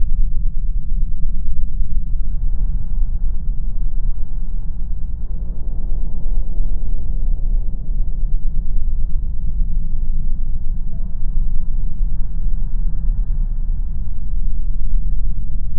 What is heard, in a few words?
ambience